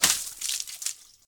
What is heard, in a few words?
splash, water; World Of Goo